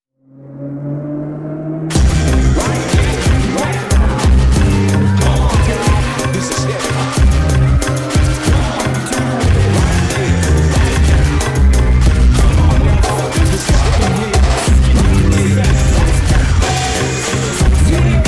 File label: Afrocubano, jingles